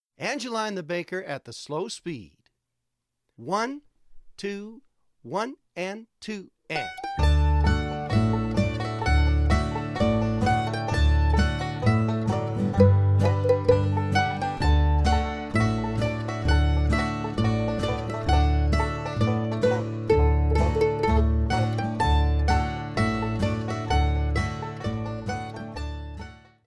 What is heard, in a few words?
slo high octave fades; Angeline the Baker